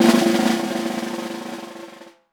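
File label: snare decrescendo mezzo forte; Best Service; Peter Siedlaczek's Advanced Orchestra; SN DECRSC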